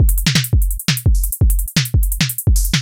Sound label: jungle breakbeat 170 bpm 2 bars